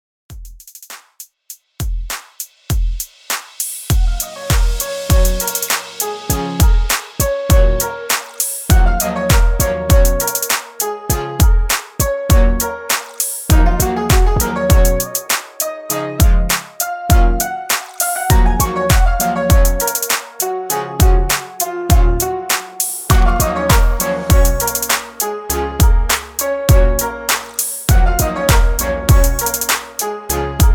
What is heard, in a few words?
100 BPM; REDRUM